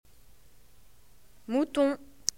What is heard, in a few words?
mutton